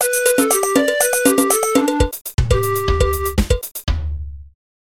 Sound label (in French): carillon